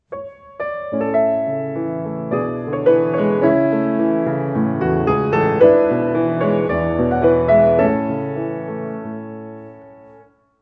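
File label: piano sound; Song Book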